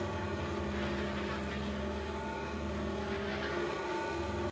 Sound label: Dark Army Blazing Mage Attack Loop